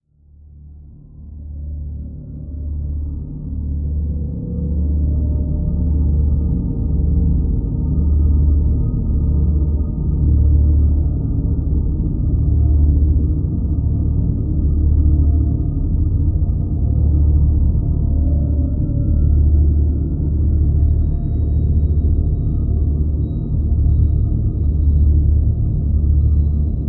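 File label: Drone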